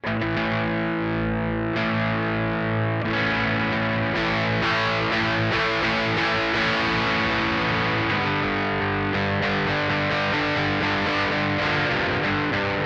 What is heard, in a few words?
Vox Valvenergy Mystic Edge Cab